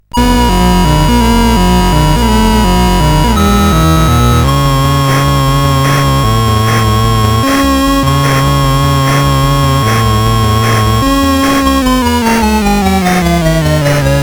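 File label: Reactor Run, MOS 8580 SID chip music, games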